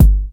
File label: sharp kick drum single hit g key